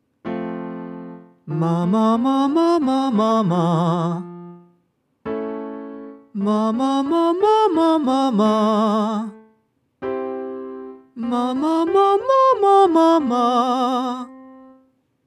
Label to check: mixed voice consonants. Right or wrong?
Right